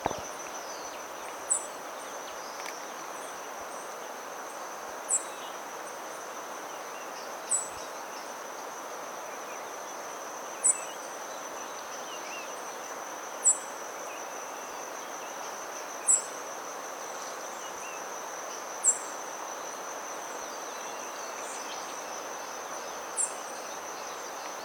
Green Honeycreeper

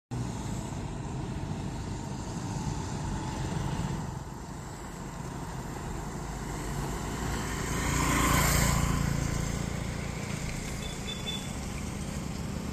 Kia Sonet; ماشین

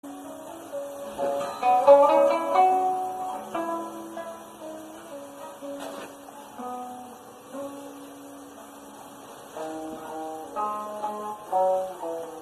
selane

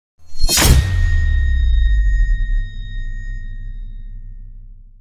Dropping

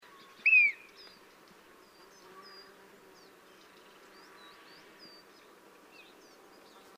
Bearded Vulture

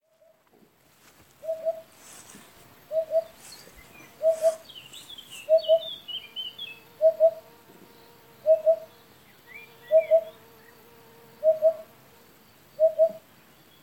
Eurasian Hoopoe

